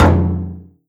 impact, metal barrel

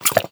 breath out, headcrab

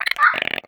idle chirp, headcrab, creatures; headcrab classic; vox

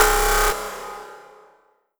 alarm oneshot; world misc; combine

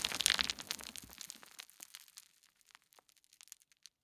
rockfall; Counter Strike Model Pack; CS:GO; dust2

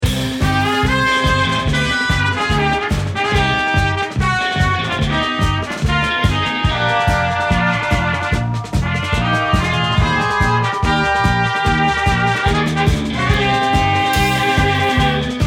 start round

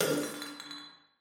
glass break; Half Life Alyx; oneshot

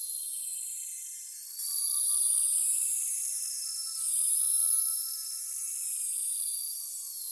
amb abstract high; Half Life Model Pack

